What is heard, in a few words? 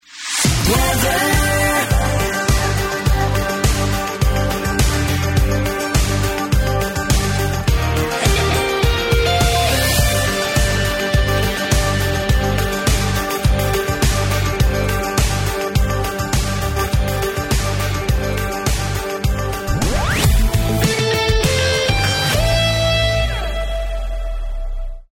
Seaside Weather Bed; Beds